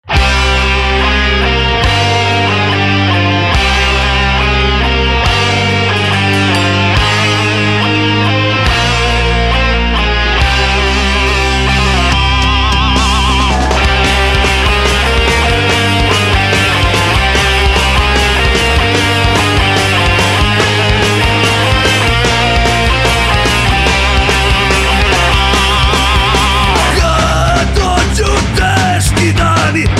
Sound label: metal RMX